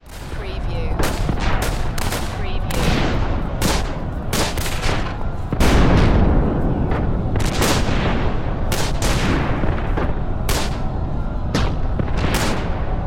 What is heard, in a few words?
Warfare WWI World War One Battlefield Guns Explosion Screams